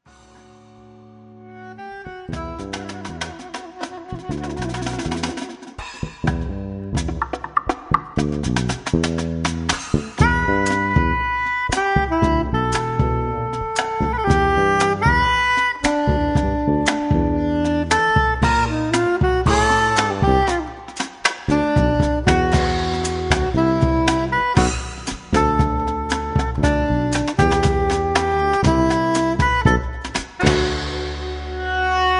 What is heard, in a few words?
Jazz